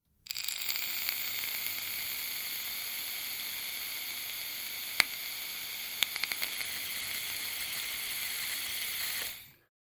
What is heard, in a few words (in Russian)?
zvuk veipa